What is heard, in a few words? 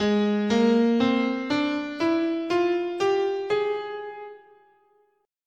Ab Lydian Augmented, scale